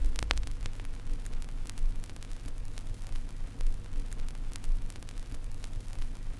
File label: Crackle, Vinyl Noise